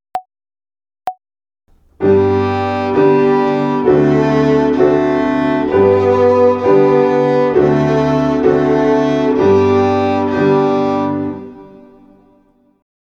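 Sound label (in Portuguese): Ginástica até o dedo 2 - Corda SOL - Binária, Violino; Violino para os Pequeninos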